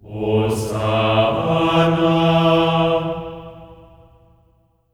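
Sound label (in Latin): hosanna A3; Spectrasonics; Symphony of Voices; GR HOSANA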